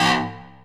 stab; Dirt-Samples

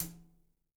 foot hat 2, gretsch; Dirt-Samples

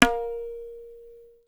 tabla; Dirt-Samples

